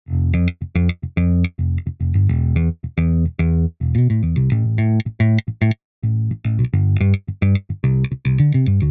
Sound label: bad bass